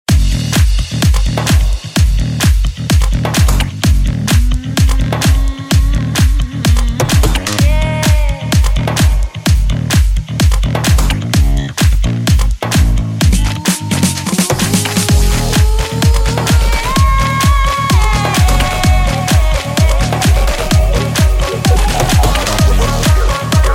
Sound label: Jazz Cat Funk Remix